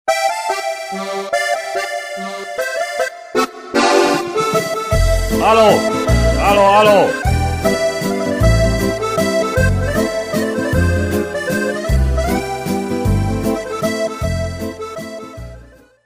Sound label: Jingle